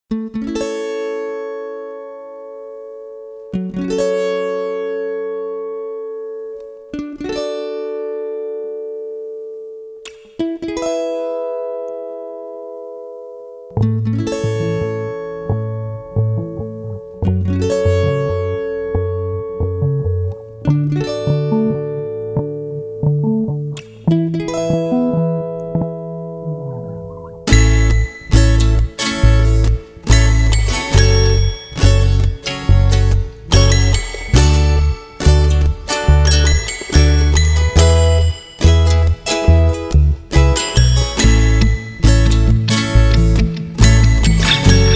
acoustic ice cream

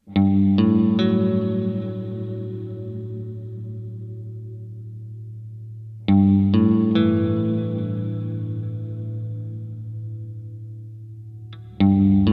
Guitar

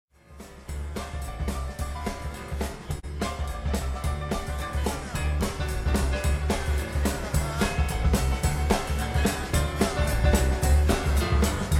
Gnawa Rock

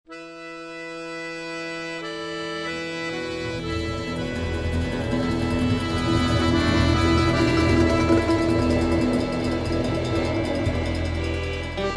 Valse Chinoise

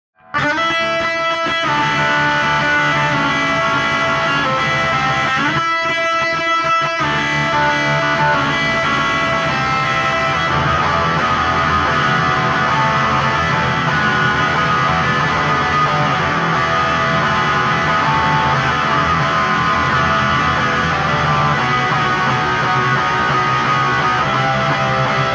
Fender Jazzmaster; EQD; Westwood; My Garage Rocks

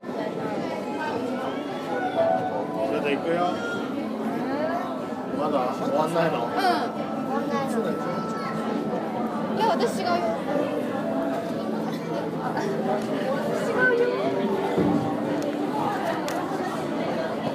Crowded mitaka

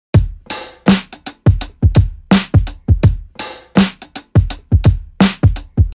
83 DRUM LOOP BOUNCY

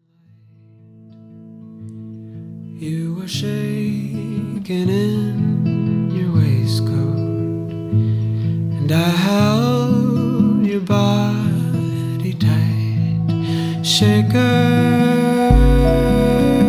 Shaker

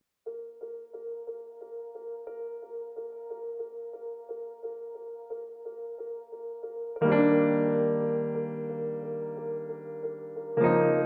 Jazz Hop; 30 Day Music Making Marathon

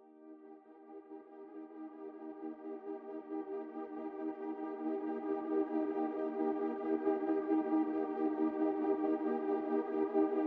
Synths Driven

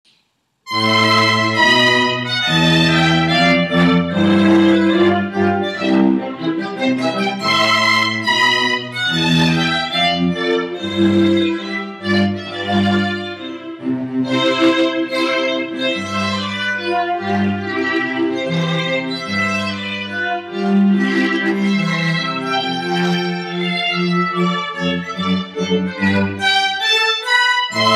Mendelssohn Wedding March

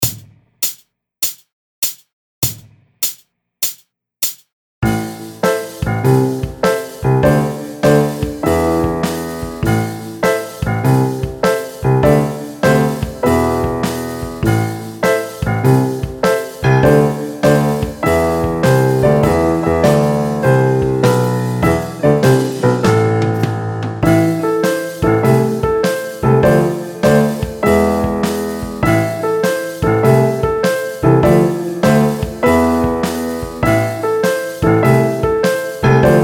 spooky; Wagner Int. Book 1

